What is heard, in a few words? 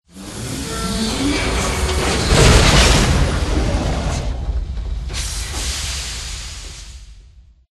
become ragdoll; gmod; lfs luna s flight school; laatc atte